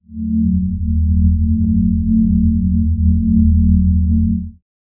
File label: low ominous drone for ten